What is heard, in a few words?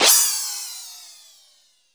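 WARP cymbal, effected drum; Crypton - DrumBase5000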